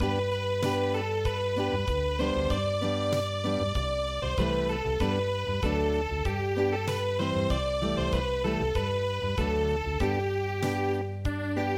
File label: Acoustic, traditional